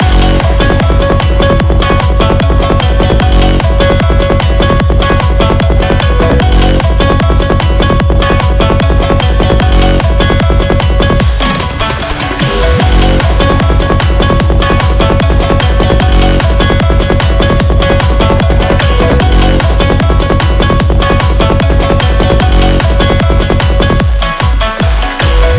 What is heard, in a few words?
BGM Stepup Battle; beatmania IIDX 30 RESIDENT; System BGM